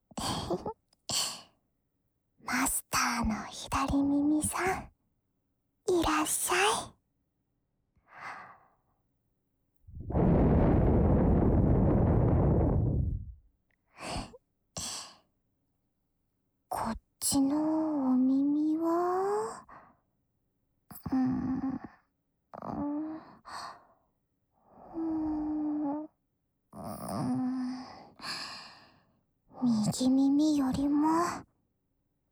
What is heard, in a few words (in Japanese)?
屋来杉耳かきの左耳耳かき。仕上げの梵天には屋久杉精油をなじませて; ウィスパートーク; 蓄音レヱル とらこ; CV.日高里菜